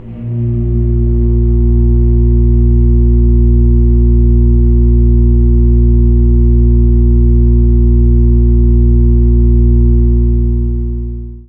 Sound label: org c#2, Church Organ, AKAI S-612 + S-900; Best Service ProSamples vol.55; Retro Sampler